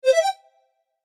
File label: Infographic call answer notification